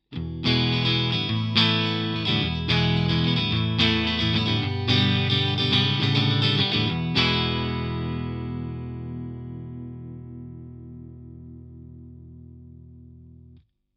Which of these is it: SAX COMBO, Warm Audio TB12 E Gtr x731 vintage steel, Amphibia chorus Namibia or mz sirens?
Warm Audio TB12 E Gtr x731 vintage steel